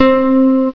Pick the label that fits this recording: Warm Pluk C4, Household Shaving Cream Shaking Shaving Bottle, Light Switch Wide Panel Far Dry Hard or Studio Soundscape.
Warm Pluk C4